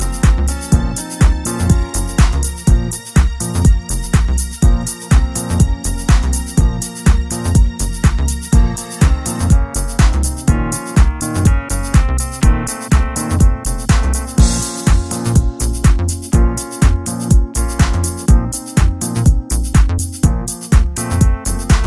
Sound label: Right Direction Inst